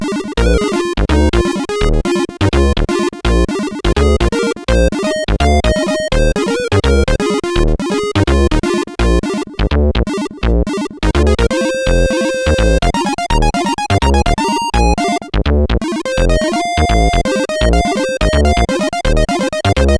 Blues, CSG8580R5; Spike Super Blues Experience

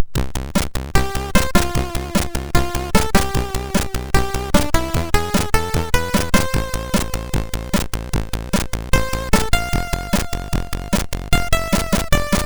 sid, MOS6581R2

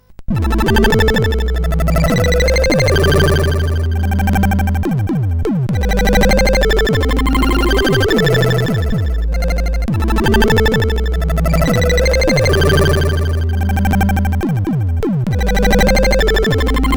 Organic Toy note, CSG8580R5

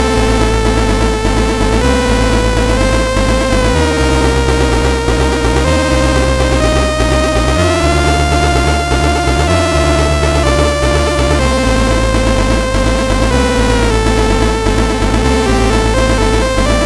Hallway sid MOS6581R4

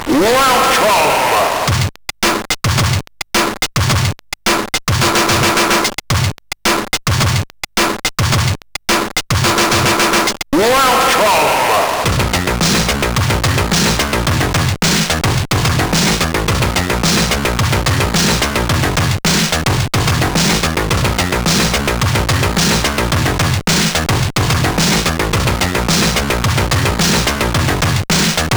Drum Exercise, MOS6581R3

